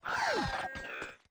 Armadillo Death; enemies

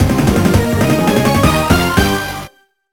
Wireless Battle End Success

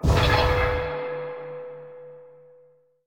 Sphere Switch

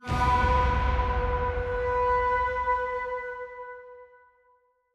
Sfx Ui Mainmenu Gamestart

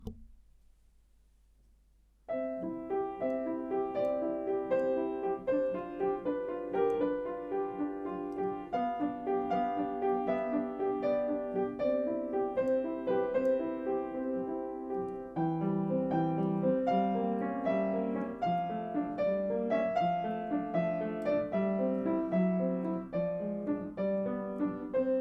Melody of Lute, Piano

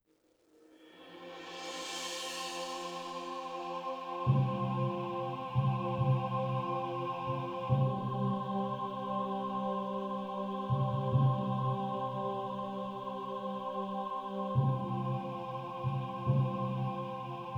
Swell; Adera; Android

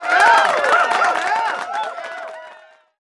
Music

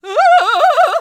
Enemy Generic Human Death Eaten

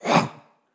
Attack

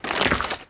Crunch

